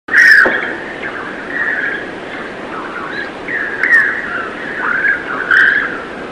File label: Eurasian jay; جیجاق